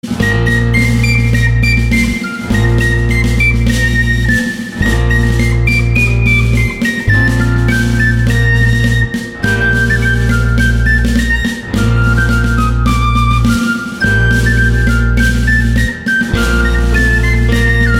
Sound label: cool classical whistle background music tone